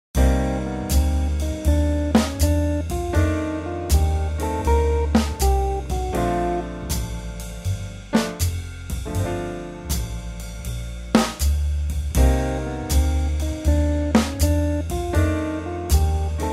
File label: Scale; Tomosax